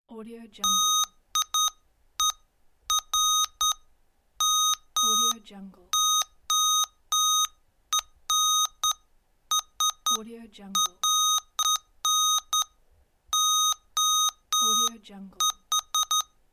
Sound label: morse code communication